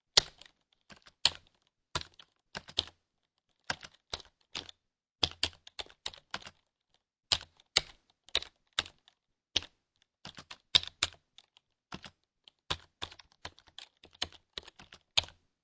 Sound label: Computer Keyboard Typing Slow; Various lengths of typing on PC keyboard